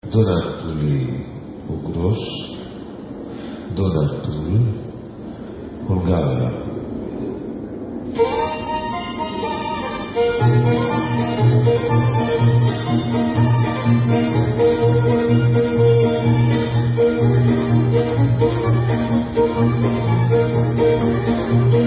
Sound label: Dunantuli Ugros, Hungarian, Instrumental